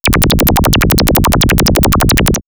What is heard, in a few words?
Laser Uzi, synthfx; X-Static Goldmine